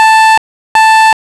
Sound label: Beeps